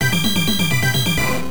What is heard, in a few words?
Bonus Up; time cruise turbografx 16